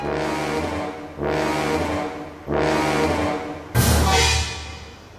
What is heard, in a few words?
suprise appear; youtube